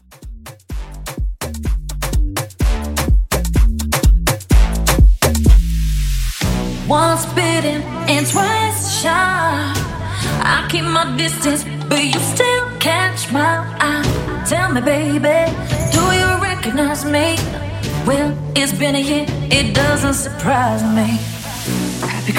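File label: Kuduro Afro Hybrid Mashup 126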